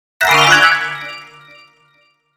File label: Logo Glass Delay Crystal Shine; Sonic Logo; 5.1 STEREO MIX; Blastwave